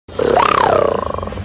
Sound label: Sexy growl